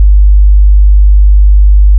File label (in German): sinus